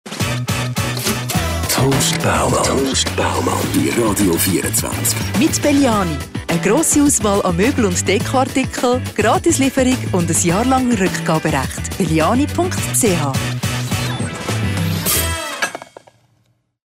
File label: STINGER; TOAST BAUMANN; Beliani 2024; R24; radio 24